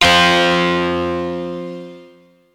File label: Guit; FUNKIN&GROOVIN'